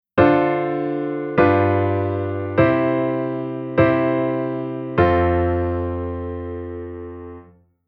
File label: Chord Sec Dominant Pattern